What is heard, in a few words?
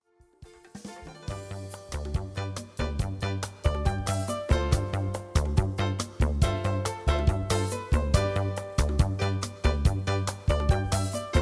Bossa